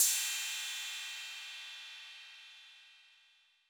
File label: Cym B 808 Sat Decay B, Cymbal, Multi Saturated